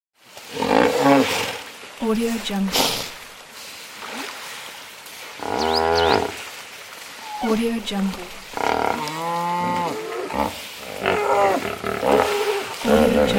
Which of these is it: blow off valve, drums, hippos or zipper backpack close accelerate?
hippos